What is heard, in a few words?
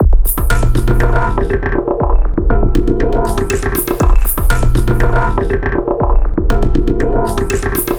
Conga Warper, Drum Loop; Sonic Foundry; The Electronic Music Manuscript Collection; Drum Loop Structures